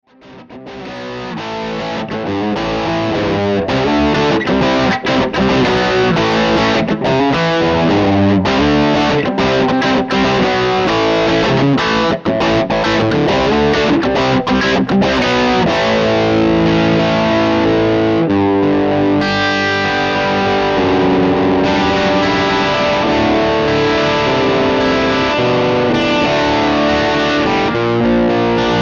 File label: CRUNCH